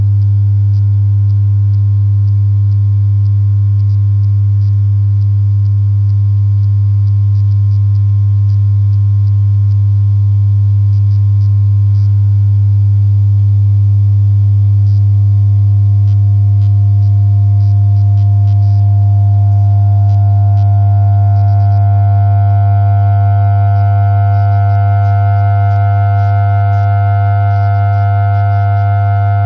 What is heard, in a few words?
side guitar